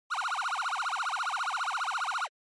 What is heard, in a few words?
Police Car Siren Dry